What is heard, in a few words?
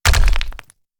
large boulder fall; earthquake